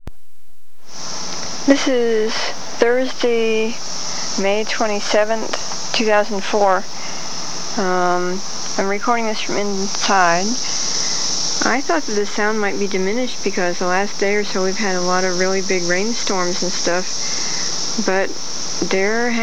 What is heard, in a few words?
cicadas